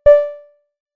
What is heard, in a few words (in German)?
spice ding; Warnglockenton, aus einem Airbus Cockpit